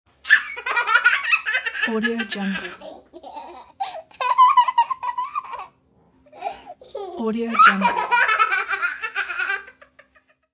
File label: kid laughing